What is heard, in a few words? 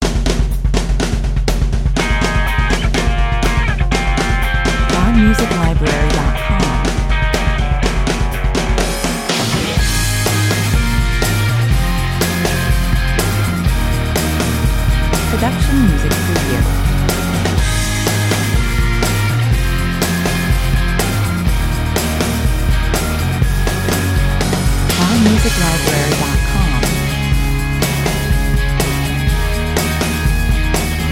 heavy rock 123 bpm